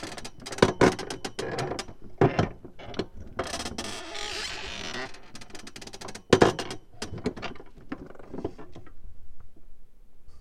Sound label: Squeaks Touching